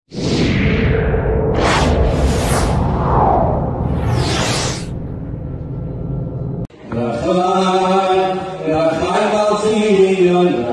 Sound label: choir acappella; zemiros